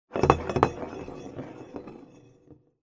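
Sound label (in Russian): бутылка катится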